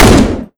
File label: FAL Shot; Angry Goy II; SoundEffects